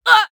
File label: Female Fight Damage; mighty party 2017 gamerip pc ios android